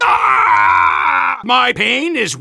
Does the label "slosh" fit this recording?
No